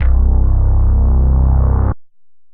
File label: Fluff Face Bass